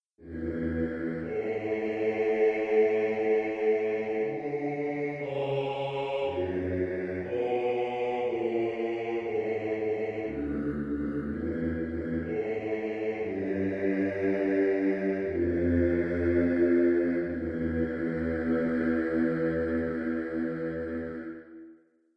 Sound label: Bass, barbershop tags